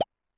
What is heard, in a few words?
collect; grunk